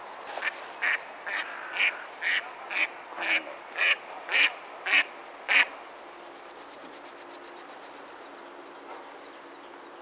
Eastern Spot billed Duck Anas zonorhyncha, Ladakh

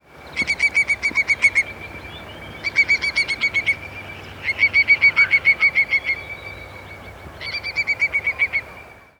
Whimbrel Numenius phaeopus; Eurasian Whimbrel; Ladakhi name:Tsupsha

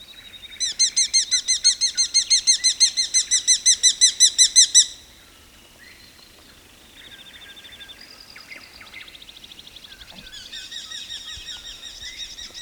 Eurasian Hobby Falco subbuteo, Ladakh

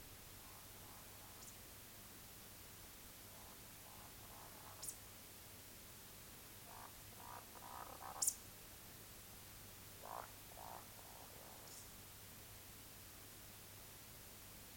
Eurasian Woodcock Scolopax rusticola; Ladakh

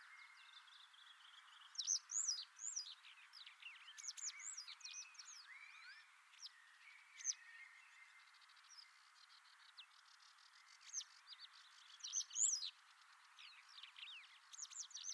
Citrine Wagtail Motacilla citreola, Ladakh

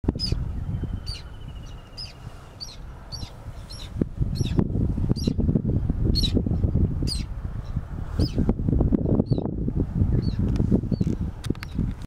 Whiskered Tern Chlidonias hybrida, Ladakh

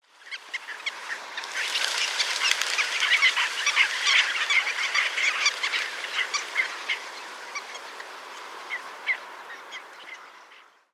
Black tailed Godwit Limosa limosa, Ladakh

